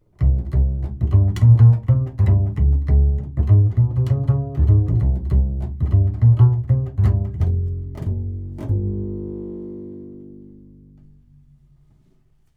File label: Upright Bass 70 cm Bridge Omni; telefunken tf47